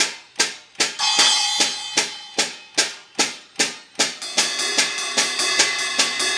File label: Hi Hat and Ride